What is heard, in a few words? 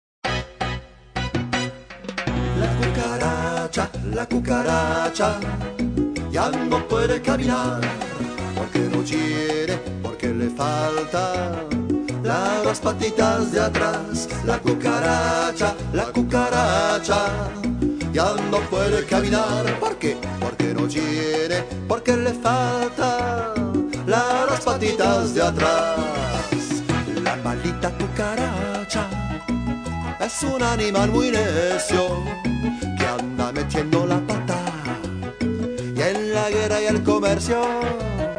cucaracha